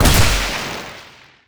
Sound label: magnetic hammer